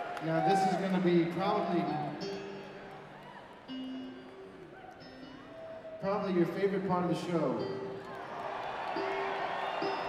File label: banjo tuning; Bluegrass; schoeps